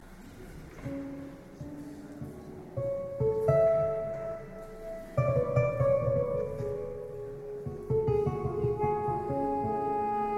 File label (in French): live impro, duos